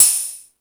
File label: High Hat, Drum Machines E-MU Pro-Cussion